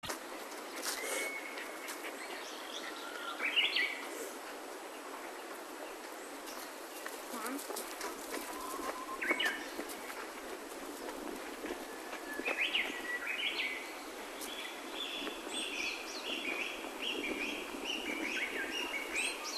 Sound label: birds